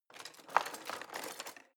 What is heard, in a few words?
knives wood drawer grab hard